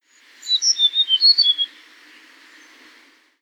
tledekan gunung

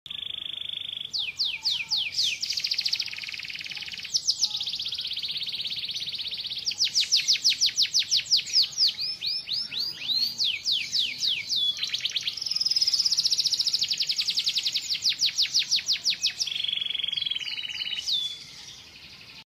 suara kicauan kenari

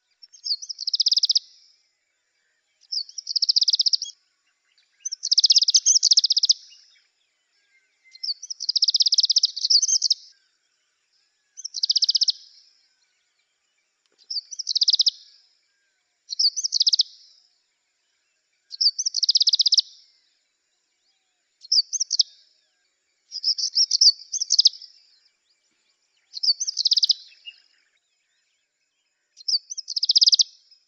suara ciblek india masteran

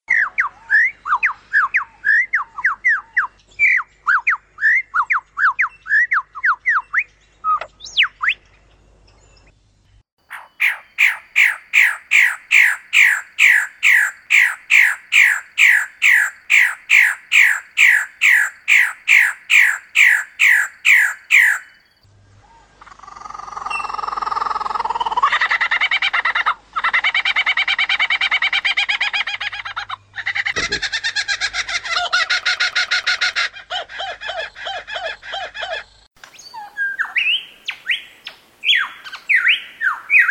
kombinasi lyrebird cenderwasih

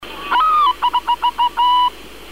suara perkutut

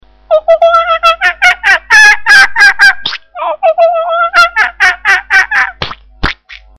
monyet

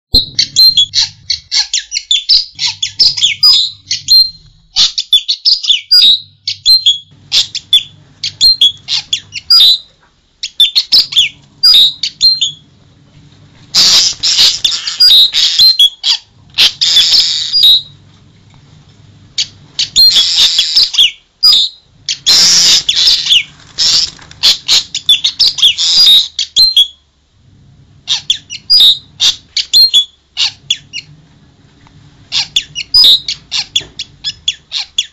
nuri tanau

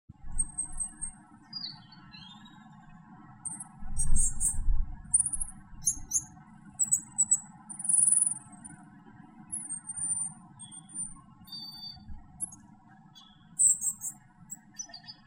suara anis enggano

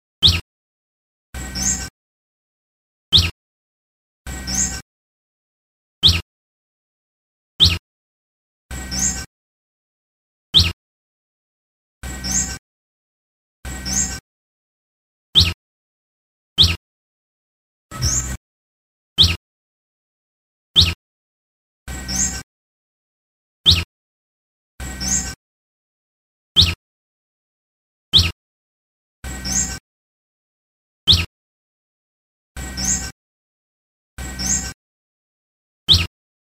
suara burung sikatan ranting betina

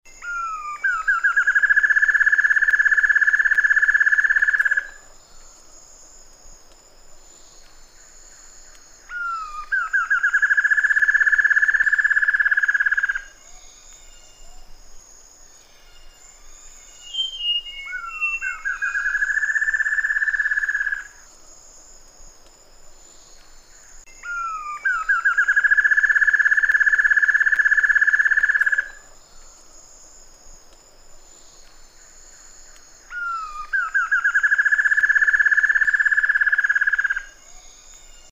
suara cekakak pita biasa

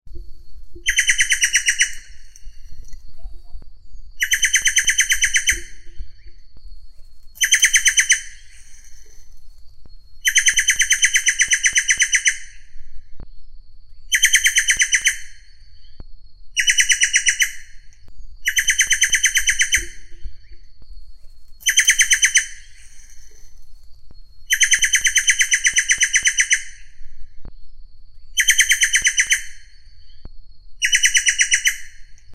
suara giant antshrike

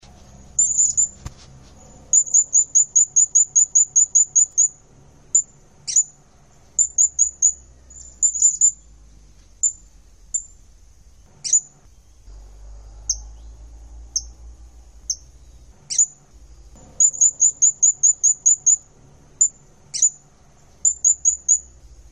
serindit pancingan

